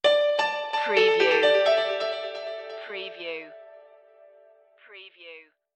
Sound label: corporate technology stinger